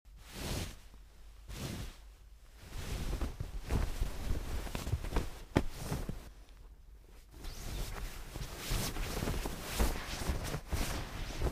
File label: Smoothing Out Blanke, covers, bed